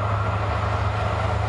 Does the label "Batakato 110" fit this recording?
No